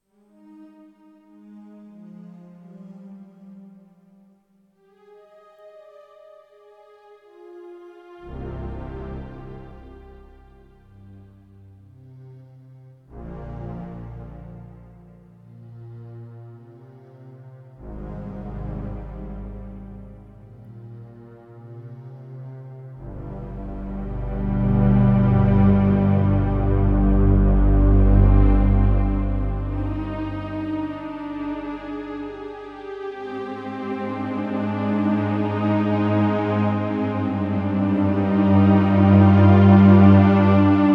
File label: s3 orchestra